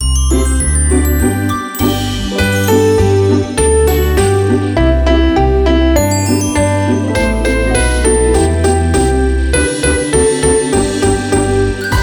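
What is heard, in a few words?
musical game, karaoke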